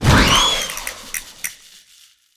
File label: Splode